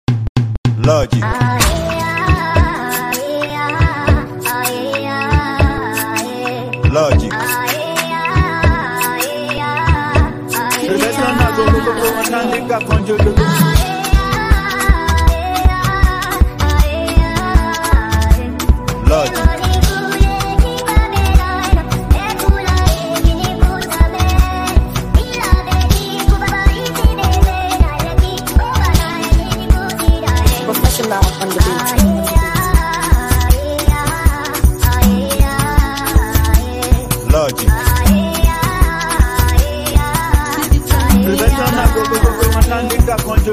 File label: Beat Chant of Praise Beat